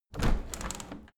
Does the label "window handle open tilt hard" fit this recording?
Yes